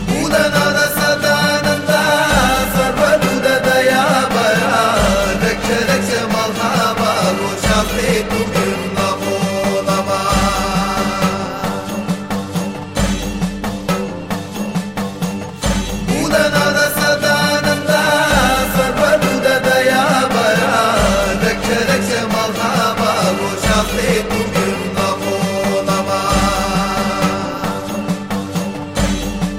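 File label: background music ringtone, DEVOTIONAL; LORD AYYAPPAN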